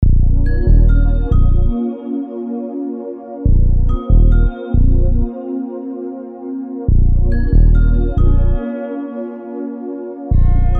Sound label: Bm 140, dark trap